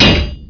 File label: Slam Door Close; Playable Tutorial Level; TR2